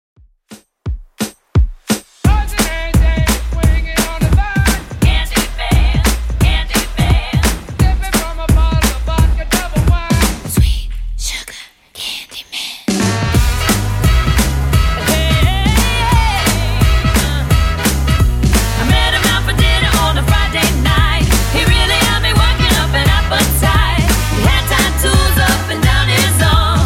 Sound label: 2ks Redrum 171bpm CLEAN